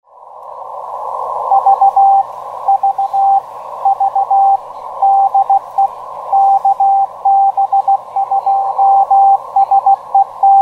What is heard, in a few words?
beacon, 10m; eton ga; 28.238; kb2seo